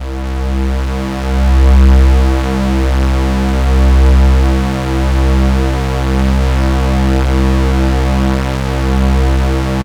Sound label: Synth, Raw Analog Multi's; Dream Zone